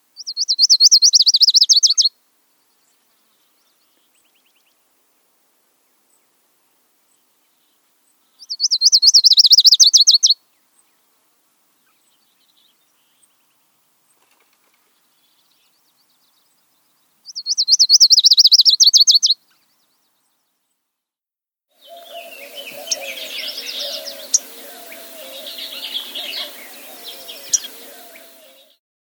Lucys Warbler

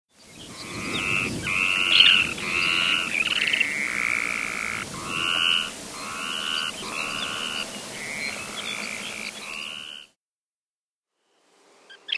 Bairds Sandpiper

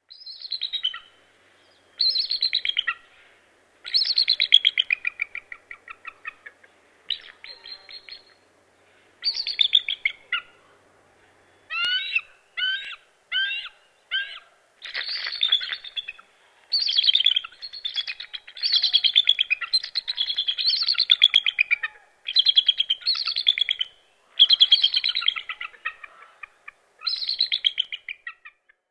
Bald Eagle

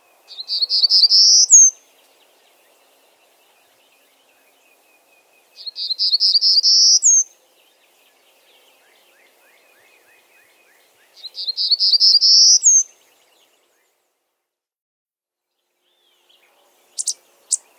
Golden cheeked Warbler

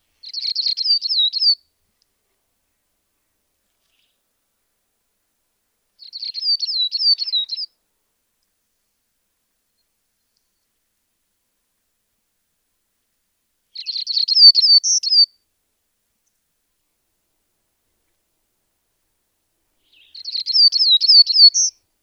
Black throated Grey Warbler, Setophaga nigrescens

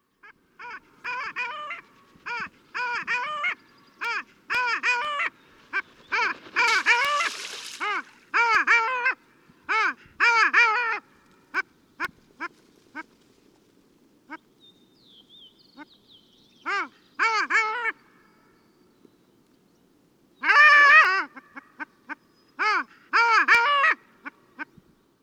Long tailed Duck

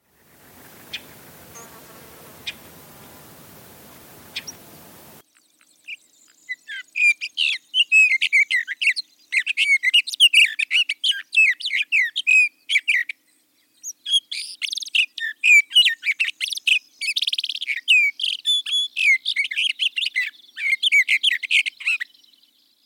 Sage Thrasher

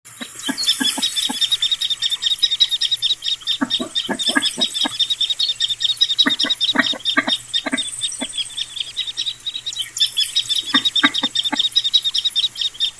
Masked Duck

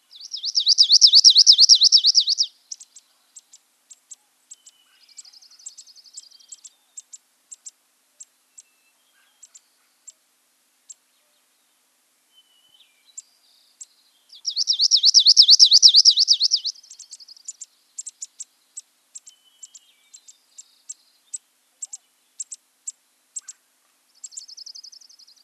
Palm Warbler

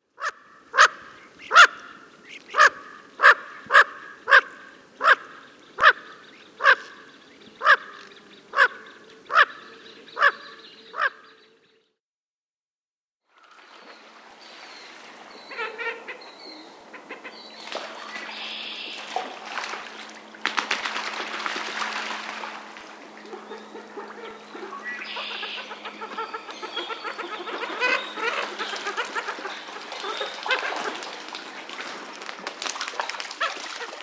Bufflehead

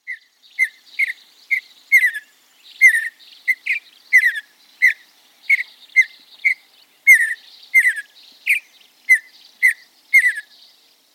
Mountain Bluebird

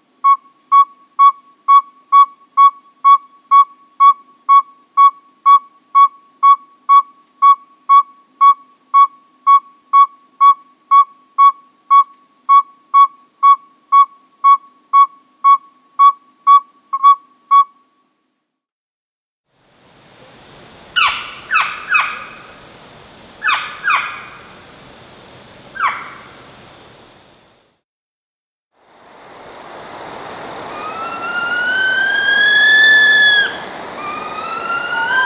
Northern Saw whet Owl

